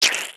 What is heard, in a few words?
flop; toxifin